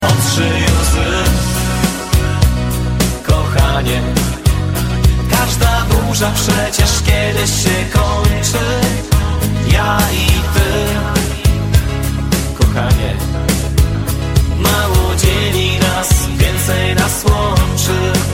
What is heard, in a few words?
romantic, dance